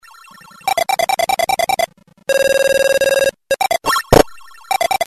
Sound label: SCV